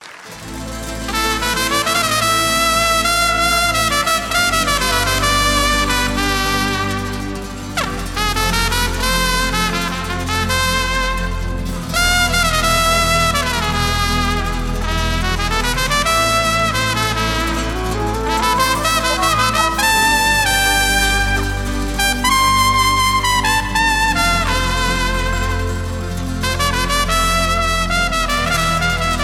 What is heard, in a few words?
Medley Latin Live; Live Aus Berlin